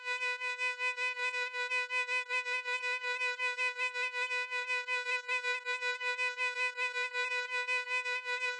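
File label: violin synth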